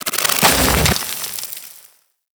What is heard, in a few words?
Micro Burrow Enter; Warframe; Corpus; Arachnoid Micro; Abilities